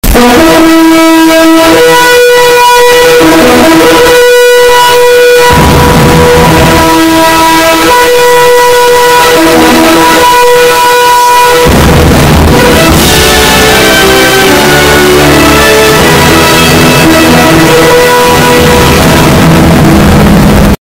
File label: THE LOUDEST NOISE EVER CREATED; memes